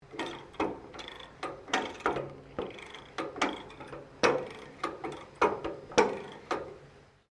PETROL BOWSER CHARIOT TYPE OPERATING, Various old or antique devices; Machinery (Sound Effects:Machines)